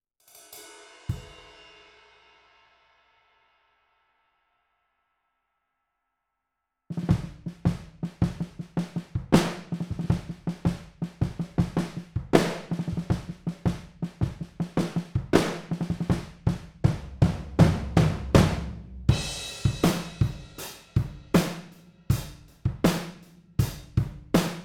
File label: altpop drums